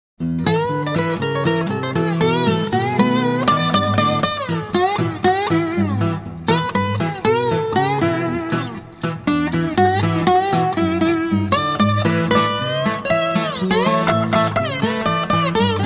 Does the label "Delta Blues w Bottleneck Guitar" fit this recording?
Yes